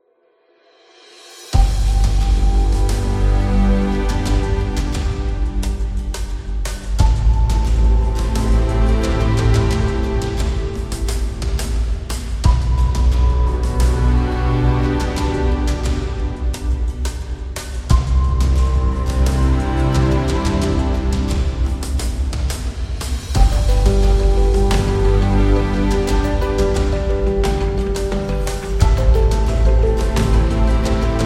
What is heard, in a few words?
Ambient; documentary